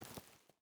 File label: footstep barefoot forest walk; Rust